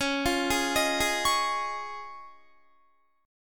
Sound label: Db Major Add 9th, Guitar, chord; arpeggiated; JGuitar